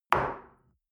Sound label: shell place on table hard; soundpool